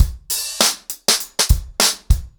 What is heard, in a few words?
100BPM, ACOUSTIC DRUMS